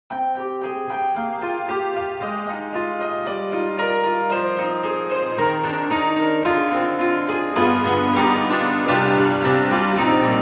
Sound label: Grand Rhapsody Piano, Chorus Echo; Waves